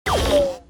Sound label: tv screen out; spacewars